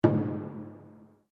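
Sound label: Drum Taiko mezzo forte, Japanese Taiko Drum; Miscellaneous Musical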